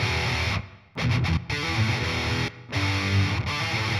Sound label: guit; ROCK FAB 6